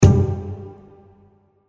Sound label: gold Symbol land; Shamans Dream 2